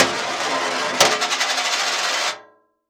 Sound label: Coin On Metal Tray